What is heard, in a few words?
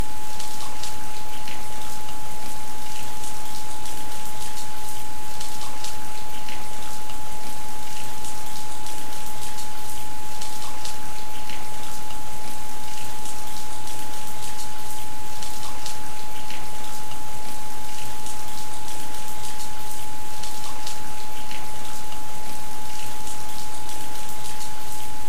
Return to spiritual order with rain sounds